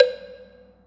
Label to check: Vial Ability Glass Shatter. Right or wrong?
Wrong